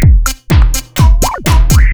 Arcade

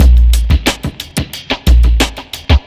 Record, chillout

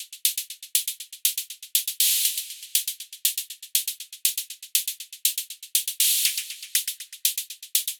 Election Kit C

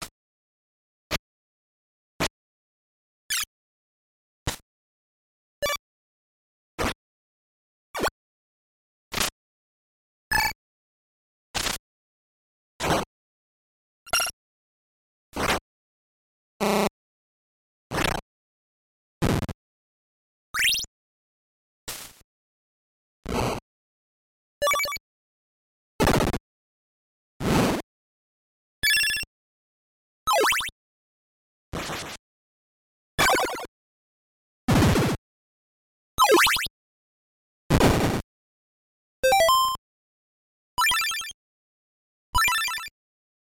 sound effects; castlevania 2 simons quest

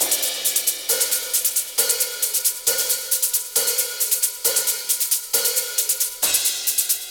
Bro Kit C, 135 bpm

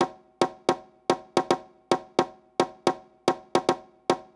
Pop Set, ритм н блюз

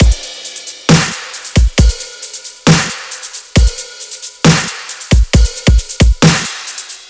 Bro Kit A; Dubstep

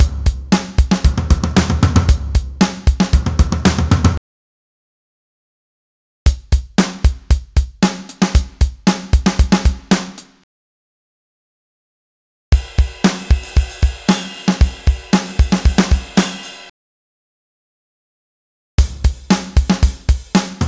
Pop Rock Drums 115 BPM; Ударные (Drum Loops)

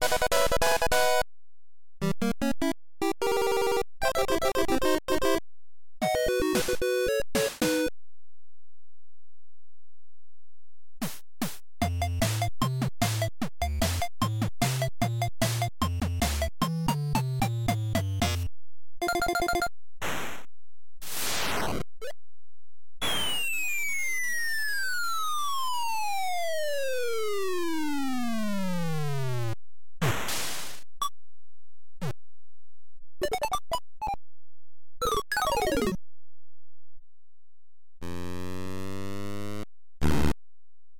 all sound effects; balloon fight

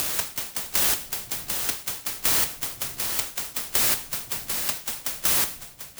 Stomp; Ударные и барабаны (Downbeat): Stomp C; 80 BPM; Downtempo; Drums

